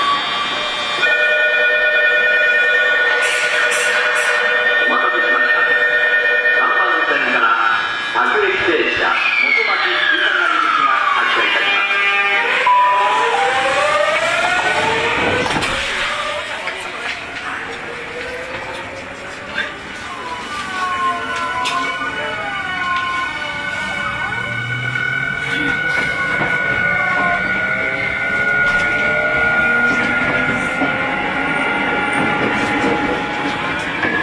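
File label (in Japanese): 走行音, 5050系; Tokyu; 自由が丘-田園調布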